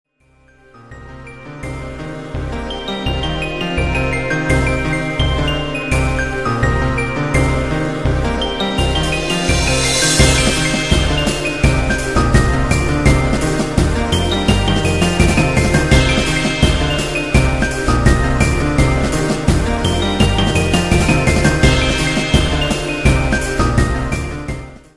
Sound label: Spooky Night